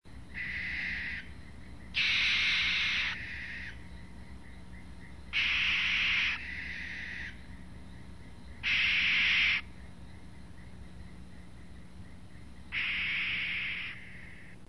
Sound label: Barn Owl, Owl Calls; summer